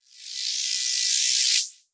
grabbity elec ladder lg; player

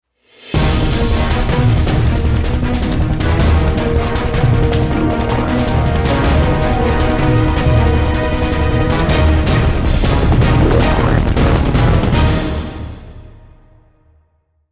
start action, music; CS2; Counter Strike

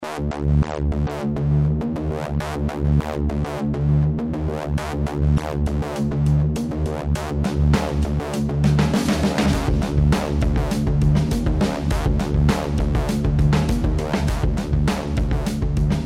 bomb planted; halflife alyx

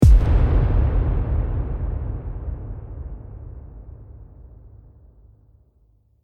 deathcam; music

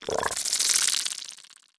idle, headcrab black, creatures; vox

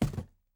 phys cube sm; Portal 2

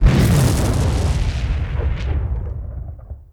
city battle; Half Life Model Pack; Half Life 2; ambient levels streetwar

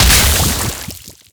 poison projectile explode

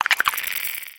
curious, clams; infestation

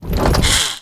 head sway, dog; npc; scripted

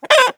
chicken death, creatures; Counter Strike Model Pack; CS:GO

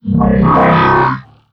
vox dry, creatures strider; seq passby

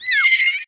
scared cower, headcrab; creatures; headcrab classic; hideout

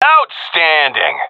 cheer, seal diver; Source Model Packs; Counter Strike Model Pack; CS2

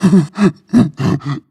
breath long, zombie

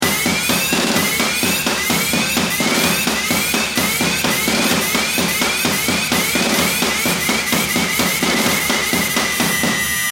bomb ten sec count; music

